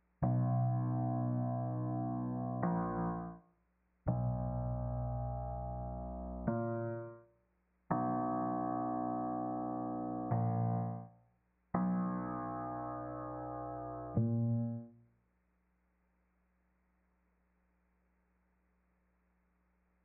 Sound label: 125 BPM B Maj Piano; Trap; Melody Loops; Loop Stems; Cymatics; Nightmares Trap Melody Loops; Quarter Back